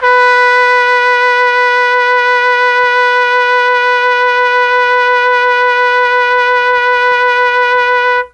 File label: B2 TRUMPET, mellotron; CHMB TRUMPET